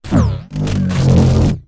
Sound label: wooshes transform sweetener